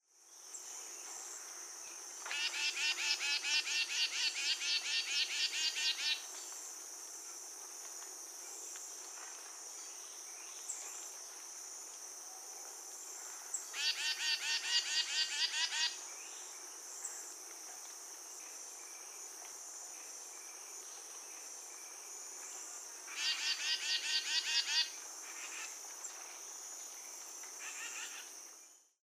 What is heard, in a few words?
Tufted Titmouse Baeolophus bicolor